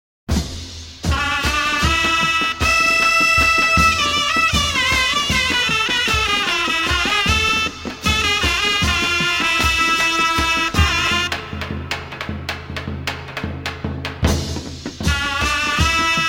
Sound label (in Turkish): Mehter